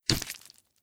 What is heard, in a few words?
Impact Flesh